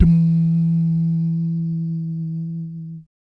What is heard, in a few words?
BASS DUMS; Spectrasonics; Vocal Planet; Jazz FX